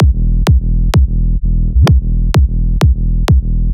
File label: Big EDM Kick Loop, 128 BPM; Future House Tools